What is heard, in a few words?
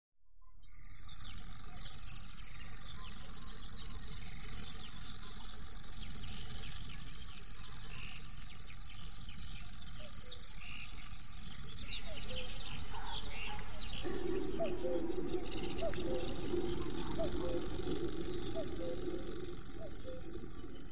grasshopper warbler